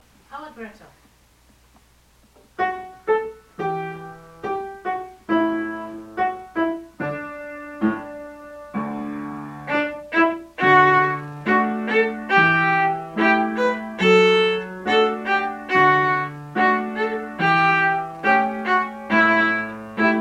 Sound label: Allegretto, tune; Strings; Practice Recordings; Level 1A